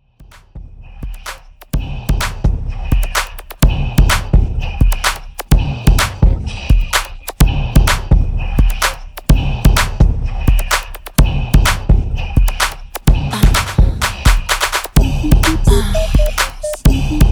Re Drum 127 Bpm

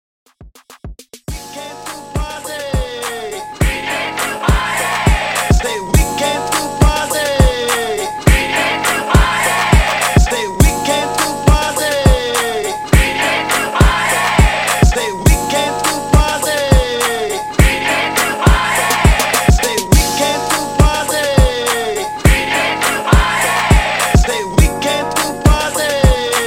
Hype Starter Redrum 103Bpm

